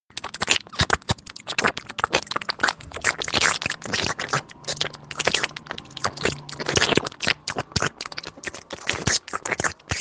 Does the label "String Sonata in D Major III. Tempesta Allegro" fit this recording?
No